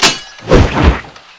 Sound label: knife slash; spknife